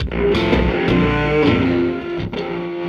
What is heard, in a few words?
Pnch Pncha Woo, Electric Guitar; Sonic Foundry (Sony Creative Software) - Friendly Fire